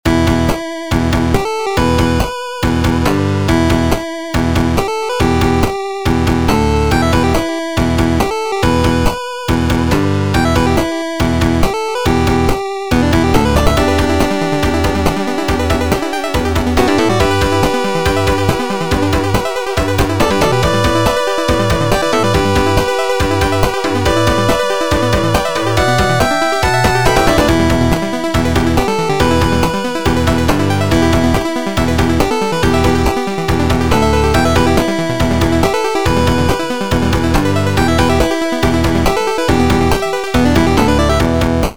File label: game music